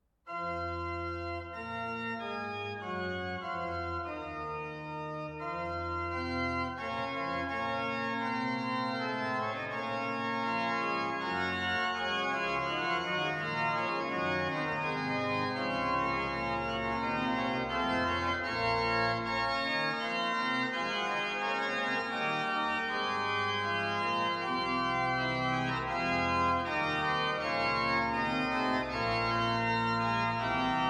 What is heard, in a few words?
Wet Diffuse; Pachelbel; Freiberg; Ach Herr